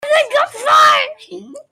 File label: fart